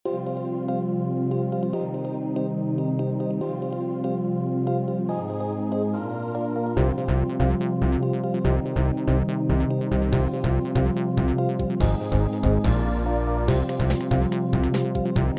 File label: bgm